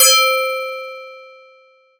shimmering glass bell fm bright with long decay

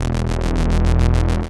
aggressive dubstep wobble bass one shot

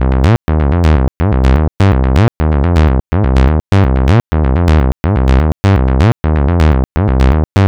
resonant 303 acid bassline 125bpm

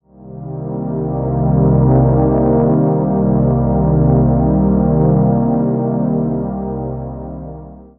warm analog pad in cm long release

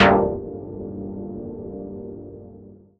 deep house chord stab in gm; 3 seconds

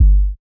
tech house sub bass pluck

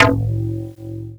robotic electro synth lead hit

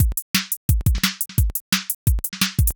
174 bpm drum bass break 2 bars

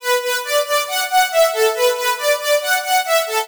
euphoric trance supersaw lead bm

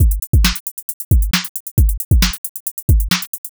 135 bpm breaks drum loop 2 bars

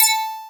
bright nu disco synth bell

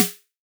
metallic snare hit

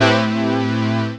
nu disco synth brass hit

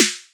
jungle snare hit

